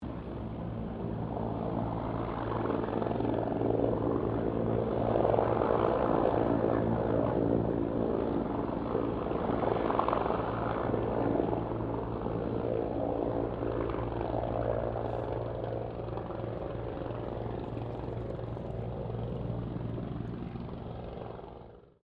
HELICOPTER BELL 204 FIREFIGHTER MANOEUVRING DISTANT